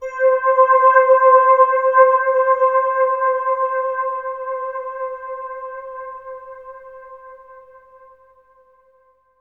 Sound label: Bell Digi Pad C, Bright; Trance Formation